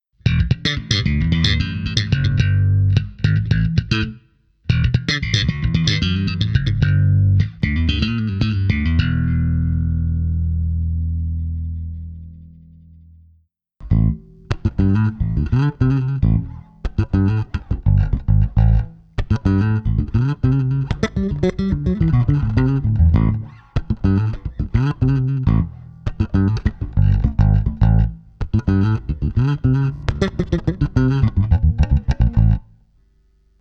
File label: jb full, Jazz Bass 1963